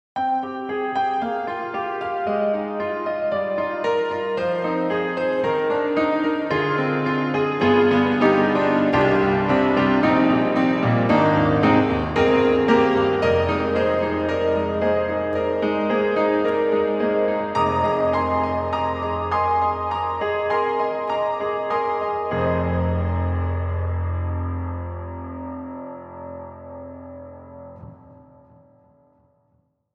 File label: Grand Rhapsody Piano, Multi FX Piano; Waves